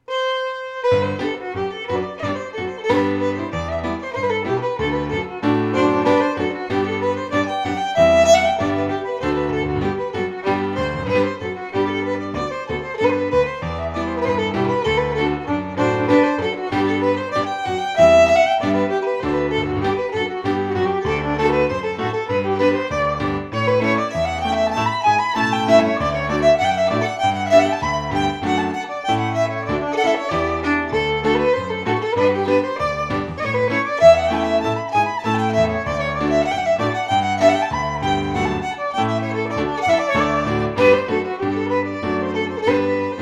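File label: Scottish Fiddling; 2019 Book o Tunes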